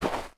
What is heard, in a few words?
snow, dig; HunterZ G4; minecraft